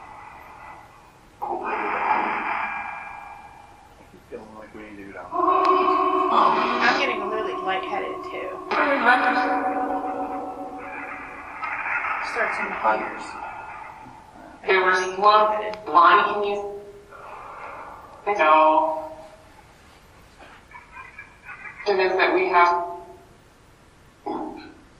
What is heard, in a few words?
Living Room Phasma Box; private residence freedom road apartment investigation return